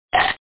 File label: bad triad; Game Zone